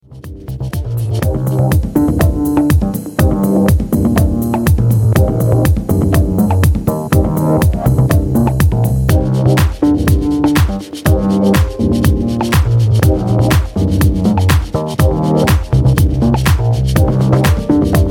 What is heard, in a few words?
Instrumental